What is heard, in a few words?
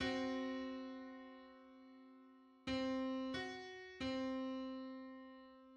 Seven-hundred-twenty-fifth harmonic on C